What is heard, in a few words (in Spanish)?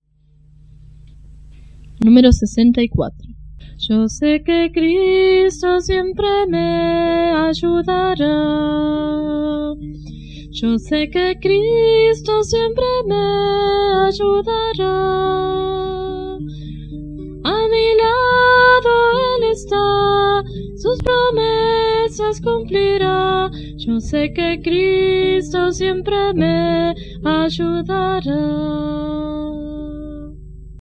Coro, himnario niños